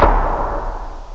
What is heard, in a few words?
SYM SNARE; M8; CMI Factory